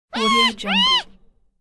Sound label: cute cartoon call whimps